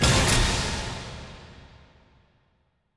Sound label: store item purchased; ui